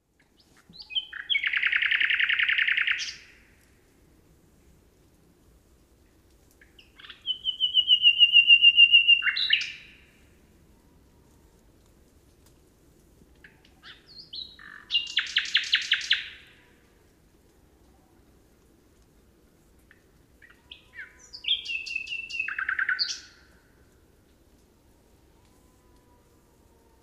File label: nightingale